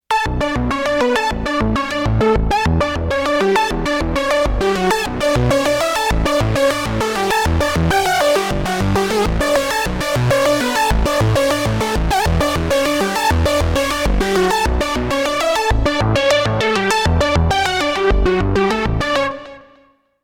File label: Perforator Lead; TEO-5